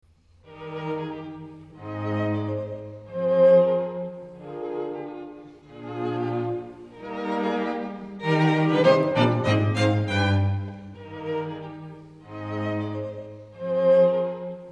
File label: String Quartet No. 10 in E-Flat Major, Op. 74 Harp - IV. Allegretto con v; Allegretto con variazioni; Ludwig van Beethoven; Beethoven - String Quartets Nos. 1-16